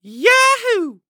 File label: Human Male Yahoo